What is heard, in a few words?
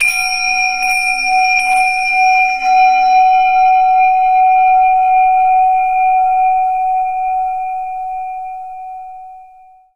zen tingsha; Source Engine; tf; japan fundraiser